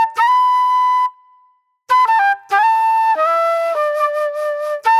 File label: Flute